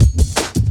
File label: New Wave Cut 1, Drum Loops, 167.5; Zero-G Jungle Warfare 1, 2 & 3; 90 s Sample CDs